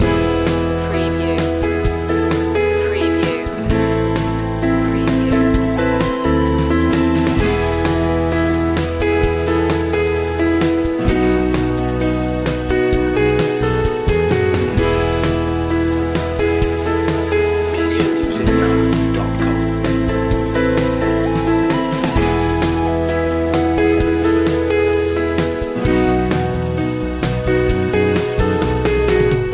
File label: piano loop